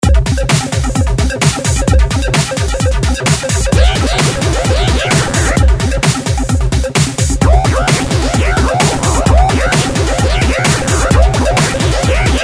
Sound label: techno